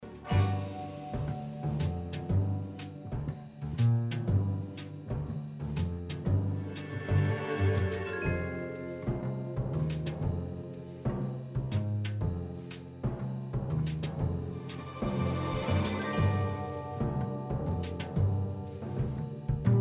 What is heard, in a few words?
Theme Music Menu loop; Agent A A Puzzle in Disguise